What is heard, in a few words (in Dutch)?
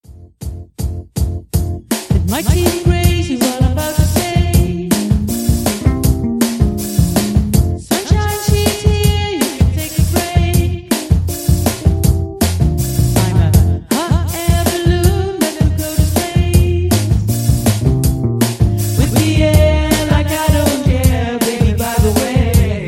zang